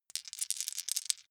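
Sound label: small four rattle in hand short, dice; wuerfel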